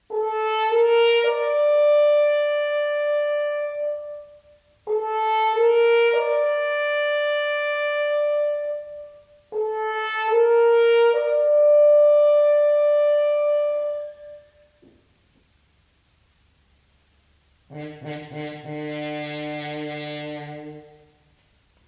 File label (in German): Ruf fur Horn